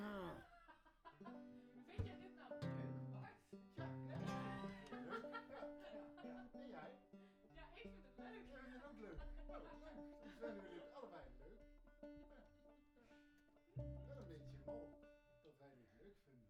Chordz

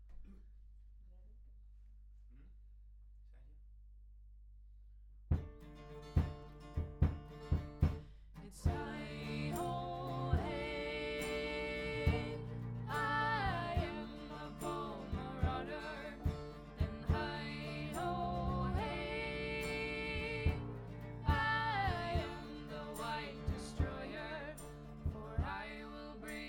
Voc Omni

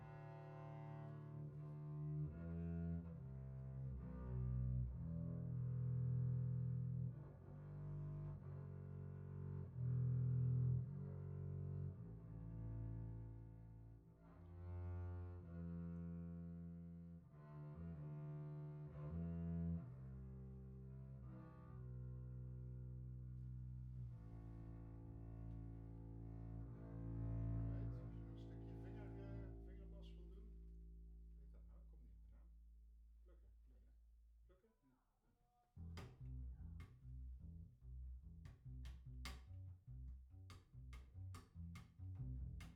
Chordz

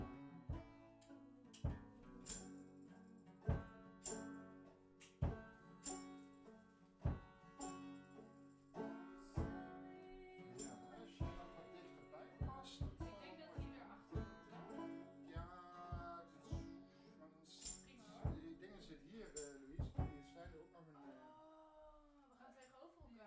Bass Mic

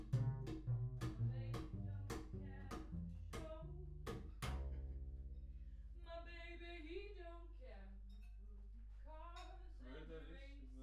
Voc Omni

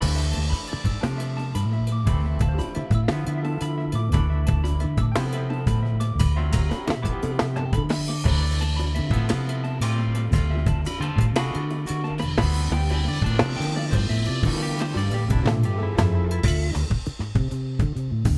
with Drm Comp; SomaAge

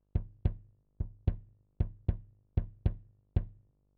Kick

